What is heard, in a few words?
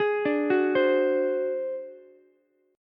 D 7th Flat 5th D down Ukulele; D7b5 arpeggiated; JGuitar